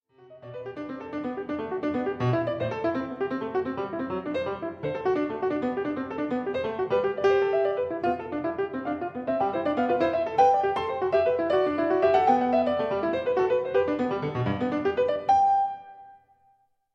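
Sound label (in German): Variation 20 für 2 Clavier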